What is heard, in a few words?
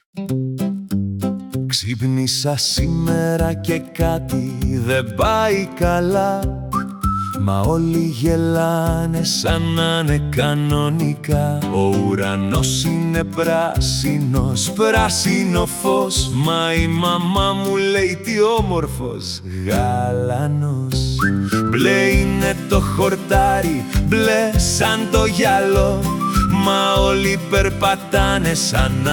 campfire acoustic